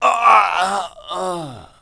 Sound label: death